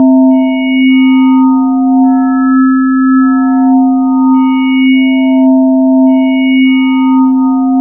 INHARMONIC; Zero-G Ambient 1

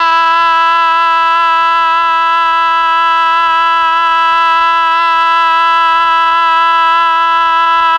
MEL OBOE

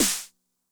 SNARE DRUM Med Tune High Tone, 909; classic machines; 909mod

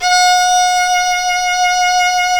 Synclavier Strings; ILIO

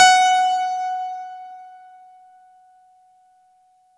F#4, synth; 90 s Sample CDs; NiceBeats; SynthLine VOL-26

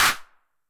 HAND CLAP, 909 Filter

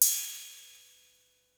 CYMBAL, Hip Hop Misc Perc; E-MU Producer Series Vol. 8 – Platinum Phatt

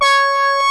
ANALOG COMBO; OMI - Universe of Sounds

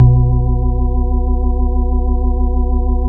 FAST LESLI, Bass Analog & Synth; USB Soundscan vol.12; AKAI

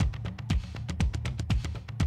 120, Classic Drum Loops

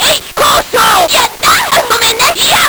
Nintendo Gameboy LSDJ

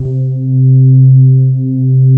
MUTE F PADS; Pro-Rec - Synthsonic

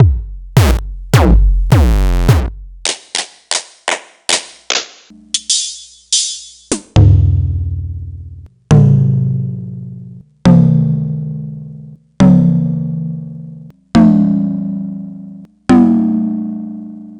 Novation Nova

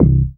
BAS DANCE, Dance Bass; Sound & Vision - Gigapack II CD 1

